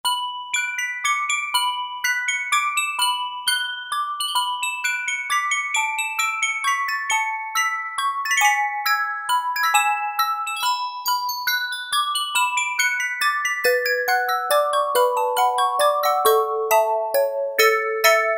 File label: Celeste, Roland MKS-70